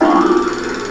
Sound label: bounce; Macworld (1999-03); Games World; Hot New Games; Cloudz 1.0.0